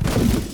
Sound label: wreck